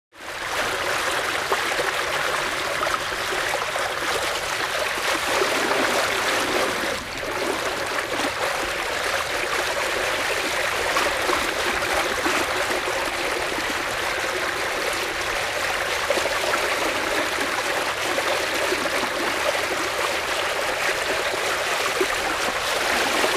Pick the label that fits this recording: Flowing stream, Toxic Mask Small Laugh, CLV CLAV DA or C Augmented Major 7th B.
Flowing stream